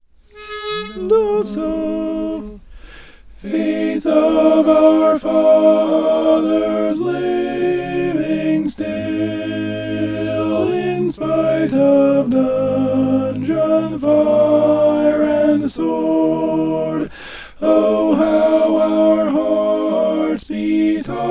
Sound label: alto; faith of our fathers